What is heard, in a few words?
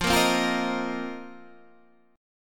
A sharp Suspended 2nd Suspended 4th